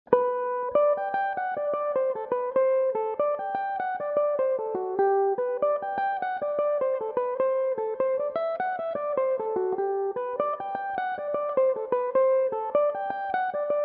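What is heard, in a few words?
G major, irish mandolin, tunes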